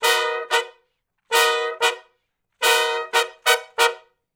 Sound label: R&B Riff (Abmin, Cbmaj), Horncraft; Sections